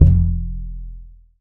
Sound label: TUB BASS F 1